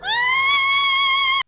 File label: scream sing; "Mystery Sound Bite" Contest